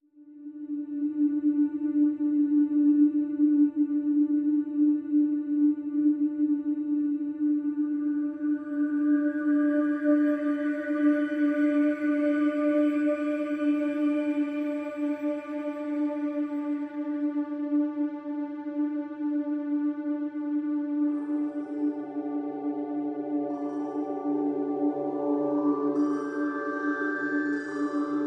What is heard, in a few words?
Pads Only